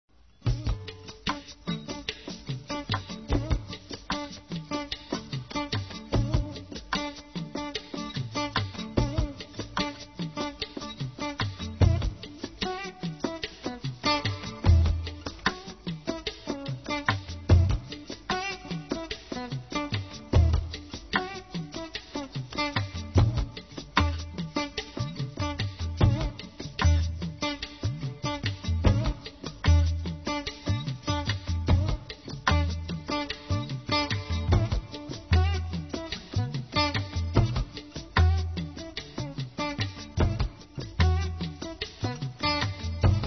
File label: Fusion Music Raga; RKC Live Stream; Travel India